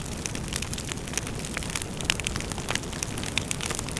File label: fire loop; cstrike; kz ins azzerun